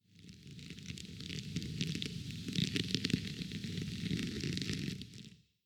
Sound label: Ambience trees; Adam's Venture Origins